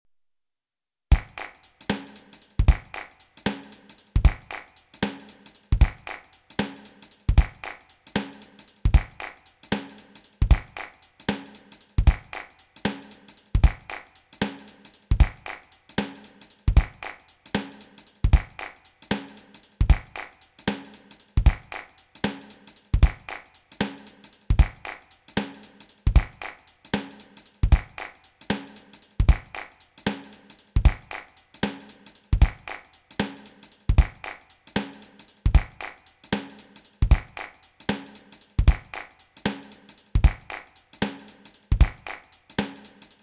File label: gospel tempo230